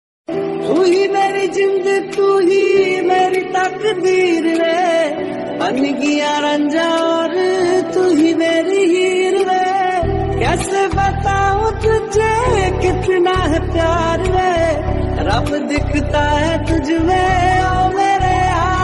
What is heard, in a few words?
Hindi Song; Singh Is Bliing